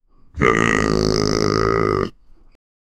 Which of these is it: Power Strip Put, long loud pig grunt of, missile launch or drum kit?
long loud pig grunt of